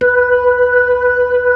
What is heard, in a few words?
B-3, Club